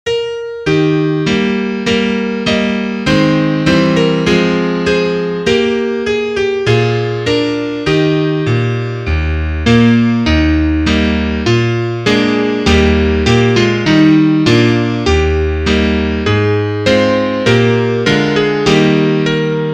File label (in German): Klavier; Liederbuch; ade zur guten nacht